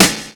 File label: Snare